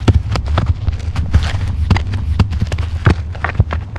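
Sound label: Rock Dirt Roll Loop; moul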